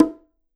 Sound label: Bongo Low Open Hard; One Shots; Drums; Ableton Live 12 Lite; Core Library